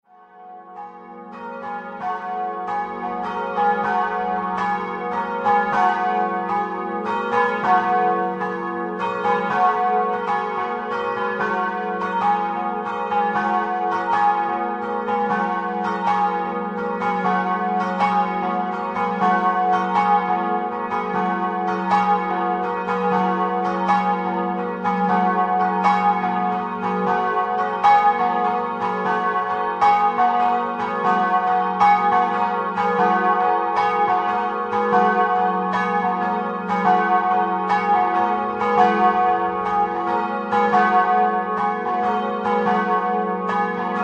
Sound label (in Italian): campane; CASASOLA DI CHIUSAFORTE